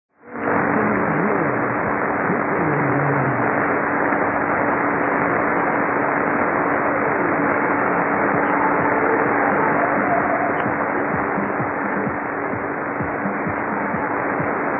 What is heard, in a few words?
6220kHz Mystery Radio